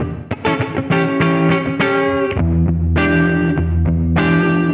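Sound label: distortion guitar; USB Soundscan vol.31; Miro s Grooves; AKAI; DISTO GTR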